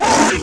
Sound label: grey wolf attack